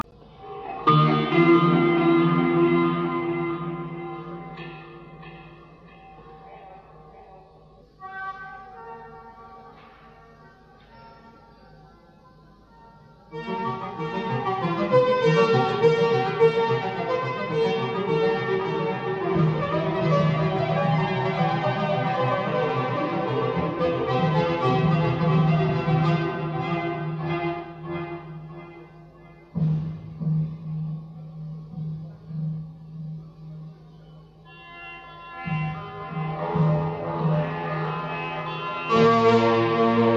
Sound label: Guitar Organ Check